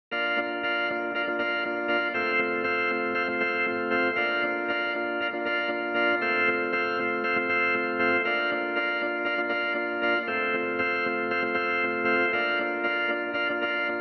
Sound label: Intro organ recreation